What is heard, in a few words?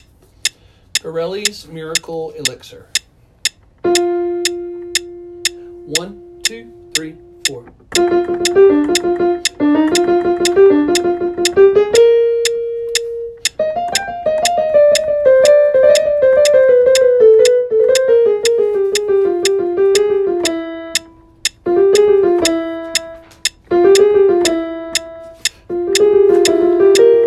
melody